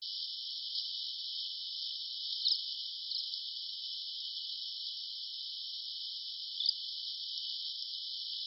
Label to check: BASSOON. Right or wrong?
Wrong